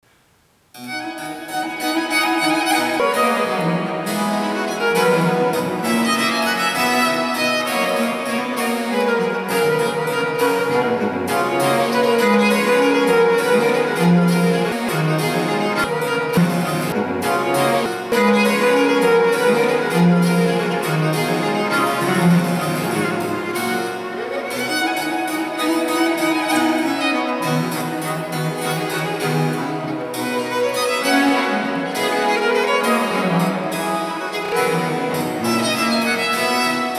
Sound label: triosonate in C allegro; G.B. Platti